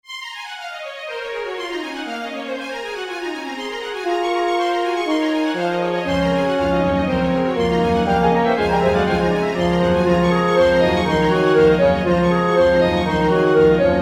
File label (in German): Cello Konzert Satz